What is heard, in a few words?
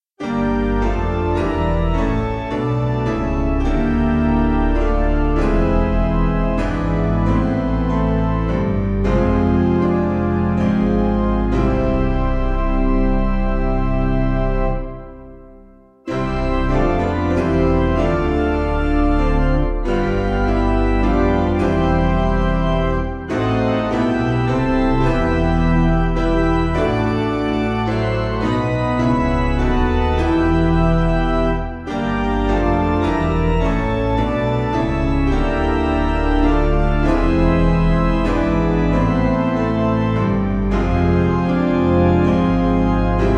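Organ Piano; None Other Lamb; Ellasgarth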